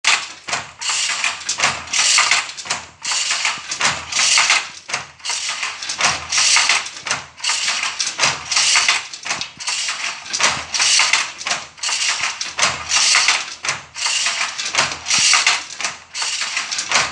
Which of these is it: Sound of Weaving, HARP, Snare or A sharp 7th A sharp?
Sound of Weaving